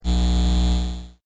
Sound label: HUM